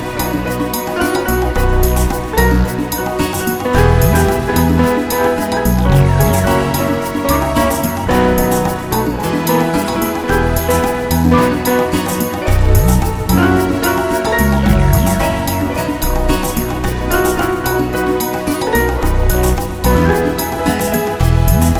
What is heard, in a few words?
A Happy Samba